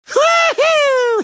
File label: laugh; tf; crash